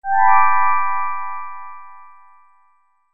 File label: ALERT